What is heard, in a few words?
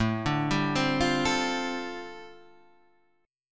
G 7th Suspended 2nd Suspended 4th A